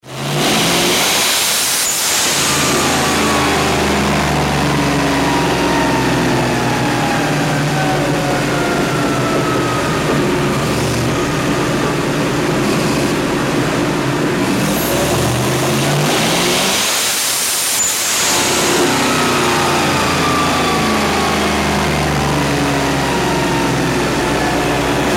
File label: v8